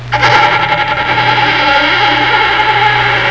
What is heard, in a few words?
creak; spook